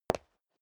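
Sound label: step high heels concrete single right, footsteps; schritte; menschen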